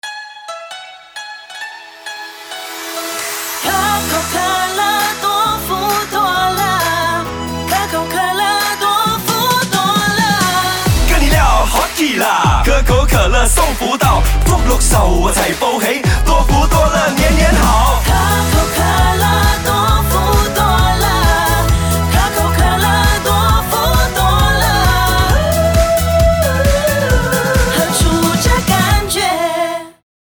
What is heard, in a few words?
Rap Mand; Coke CNY